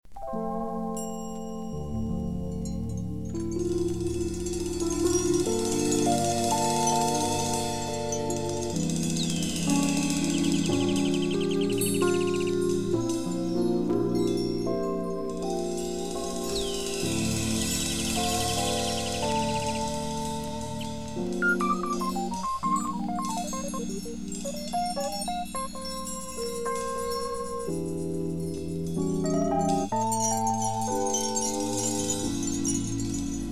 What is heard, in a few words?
bossa